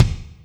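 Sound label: kick Solid Room, Alesis D4 drum machine; 300 Drum Machines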